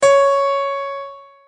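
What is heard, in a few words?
C sharp 5 piano; notes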